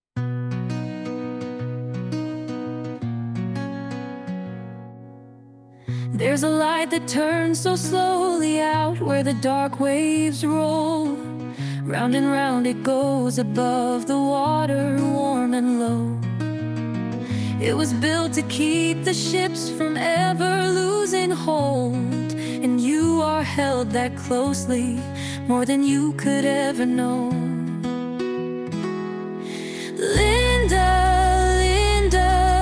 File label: lullaby, name songs; linda